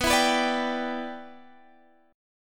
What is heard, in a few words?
B Suspended 4th Sharp 5th Add Sharp 9th B; Bsus4#5add#9; strummed